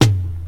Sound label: hi tom drum single hit A key